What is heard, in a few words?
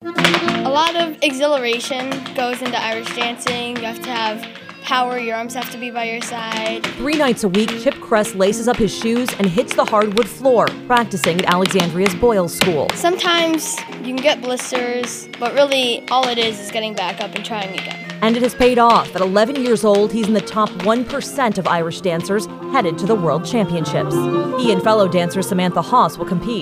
irish dance